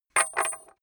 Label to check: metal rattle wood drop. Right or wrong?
Right